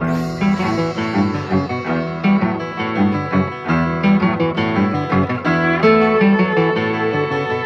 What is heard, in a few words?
guitar and strings, Loops, Sad